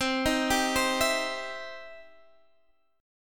E Minor Major 7th Sharp 5th, C

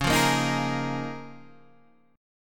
Db 6th Add 9th Db, down, Guitar Open D

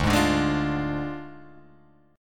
D Diminished Guitar Open G, chord

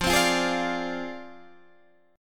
Ab 6th Flat 5th F

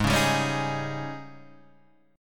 G sharp Major 7th Suspended 4th, Guitar, Drop D

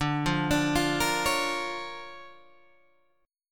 D Minor Major 7th Sharp 5th, D, Guitar, Open G

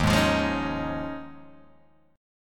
Eb 9th Suspended 4th, Guitar, Open G

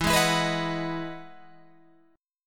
E Major 9th E down Guitar Open G

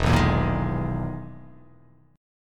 E-Major Sharp 11th E

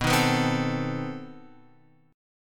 B 7th Flat 9th B down Guitar Open F; B7b9 strummed; JGuitar

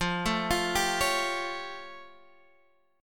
A Augmented 7th F

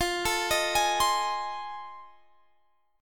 F 7th Sharp 9th F Guitar Standard; arpeggiated; chord; F7#9; JGuitar

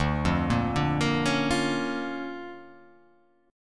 D Minor Major 7th Sharp 5th, D

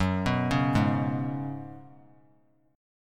F sharp Suspended 2nd Suspended 4th; arpeggiated; JGuitar

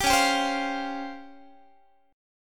C sharp Major 7th Flat 5th, C sharp, Guitar; strummed; 22,21,22,22,21,x

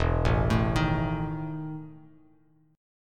E Major 7th Suspended 4th E down Bass Standard; EM7sus4 arpeggiated

